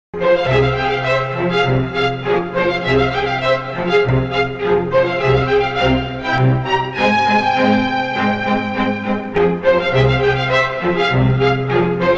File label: love bgm